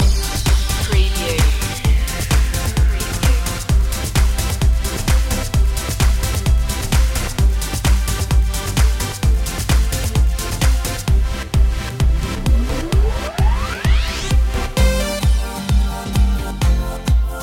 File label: fresh and funky loop